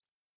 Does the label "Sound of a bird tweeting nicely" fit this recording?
Yes